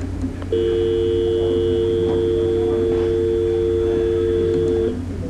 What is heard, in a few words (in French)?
tonalite telephone